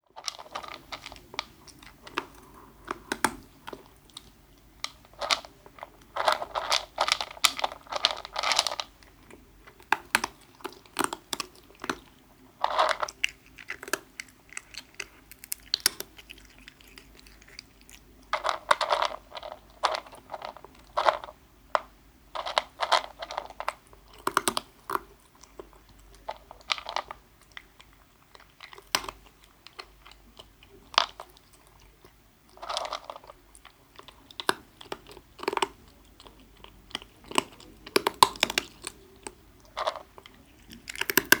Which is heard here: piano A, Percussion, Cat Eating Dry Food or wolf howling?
Cat Eating Dry Food